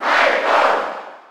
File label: Cheer German; SSB4